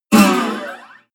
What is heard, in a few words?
bottleneck strings slide down noise